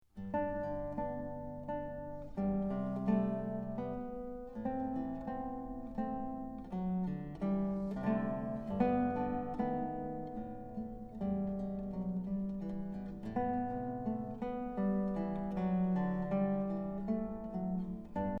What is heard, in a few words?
Lullaby; Chante no.1